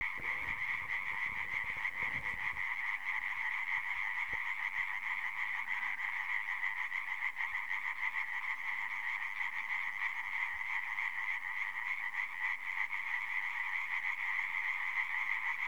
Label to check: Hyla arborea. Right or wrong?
Right